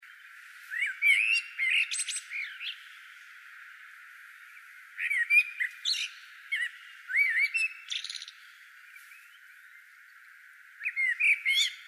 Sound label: Blackbird